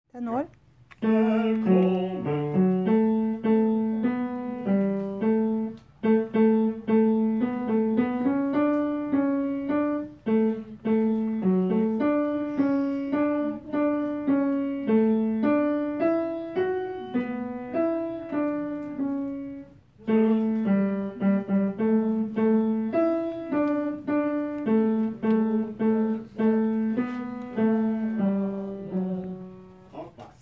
tenor

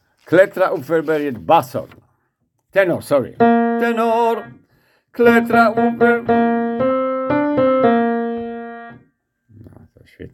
bas

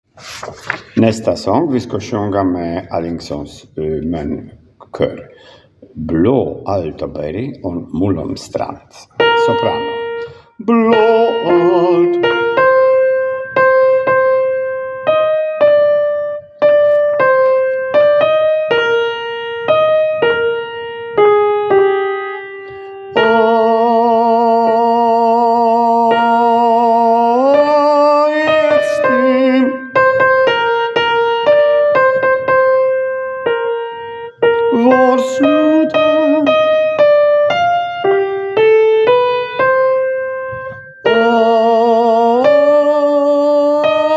sopran

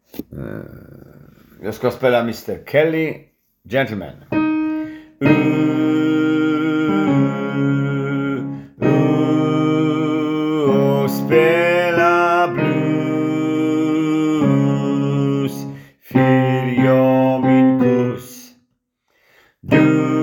tenor o bas

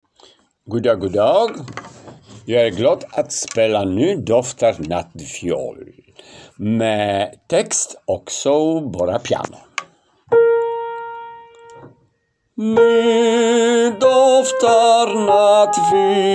sopran

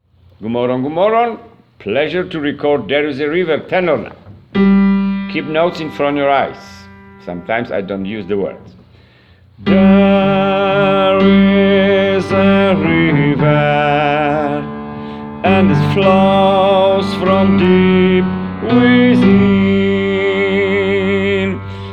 tenor

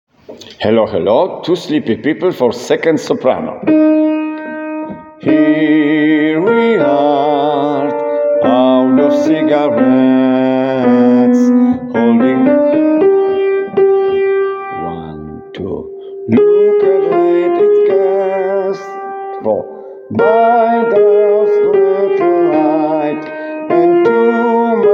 sopran

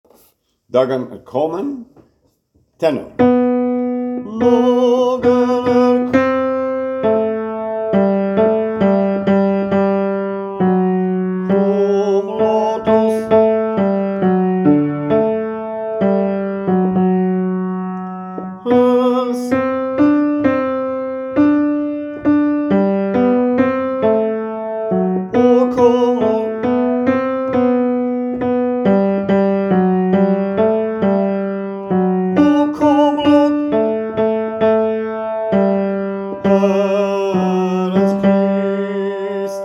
tenor

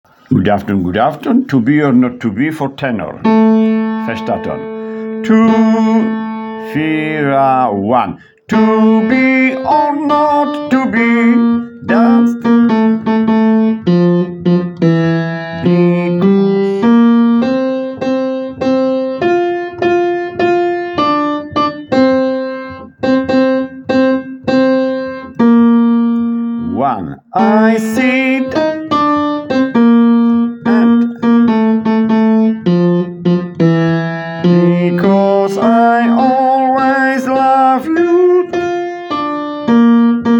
tenor

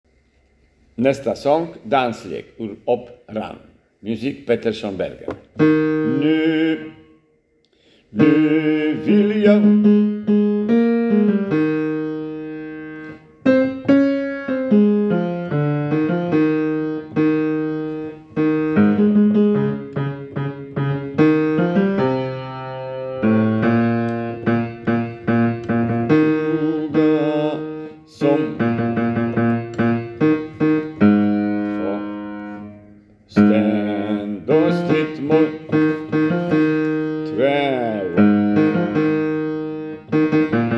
operamusik bas

